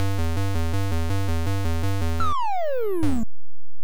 Donkey Kong Falling; NES

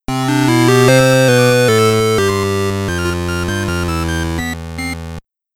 Rest Fanfare; Voidspire Tactics

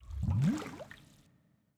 Bubble; the princess and the frog

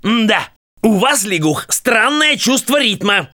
Drums; Twitch Drums; The Princess and the Frog

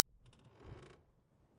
Under Bed Peek

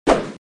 Sfx Dark Lord Attack

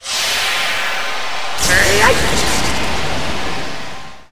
SFX Return Pod; Wario Land Shake It

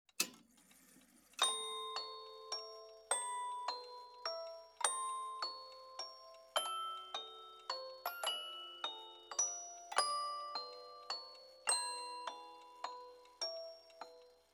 musicbox short; Joe's Diner

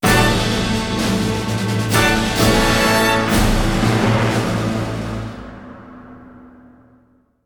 Win; Terminator 3: War of the Machines

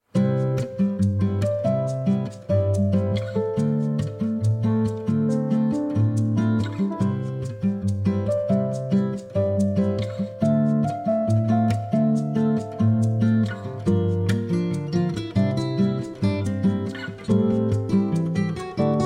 Bossa Nova Love; Pictopix

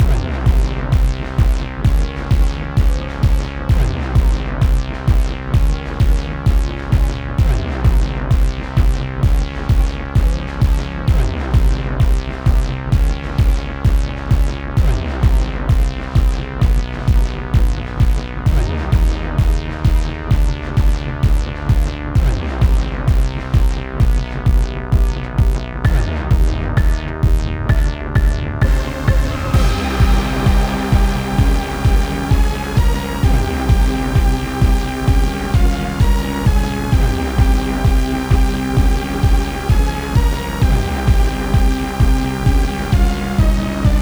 Music Alien; Dreamball

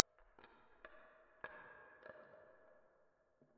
Running Puppet

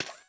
robot step

